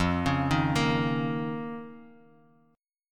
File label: F 7th Suspended 4th Sharp 5th F down Guitar Drop D